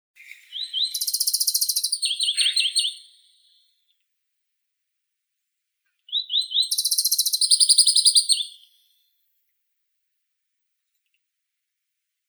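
Pallas's leaf warbler Phylloscopus proregulus, nature sounds; penochka korolkovaya; zvuki prirodi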